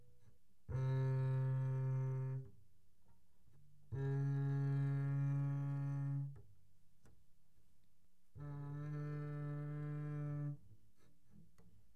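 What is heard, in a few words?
Bass arco pianissimo sul E C3 D3, doublebass; Strings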